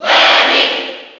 Cheer NTSC; Lemmy Koopa; SSB4

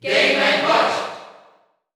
Cheer Dutch; Mr. Game & Watch; SSBU

